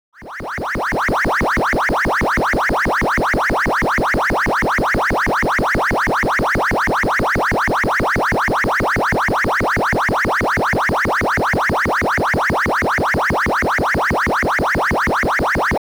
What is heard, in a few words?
charge